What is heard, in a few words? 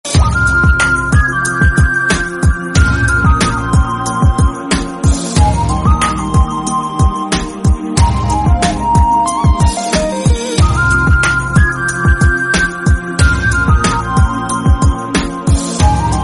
flute Ringtone; Orange Movie